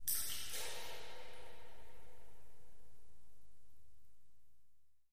Echoey Cave Drips Wa

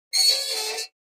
Metal Scrape On Metal

